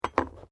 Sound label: KNIFE KITCHEN PUT DOWN; Various knives; Kitchen (Sound Effects:Household)